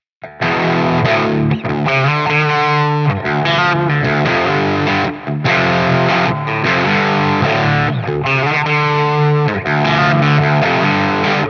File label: Bells Crunch